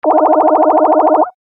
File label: Collect Beeps X16, video game sound effect; Console Fx - Collect Beeps X16